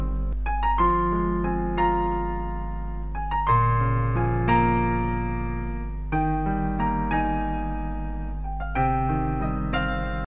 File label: Sms Tone